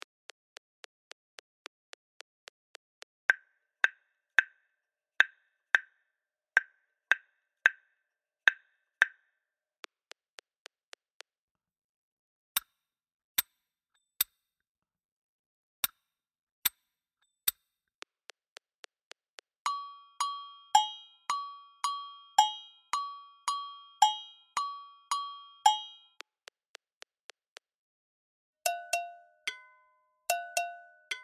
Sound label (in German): Adenkum 110bpm, Afrikanische Rhythmen